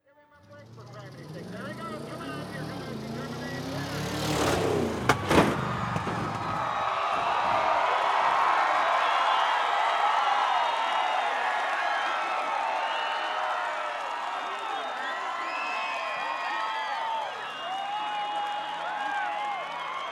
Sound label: monster truck race